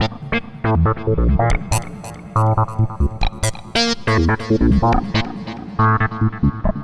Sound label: Synth